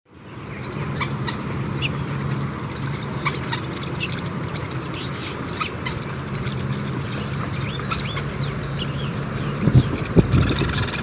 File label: gray-and-white tyrannulet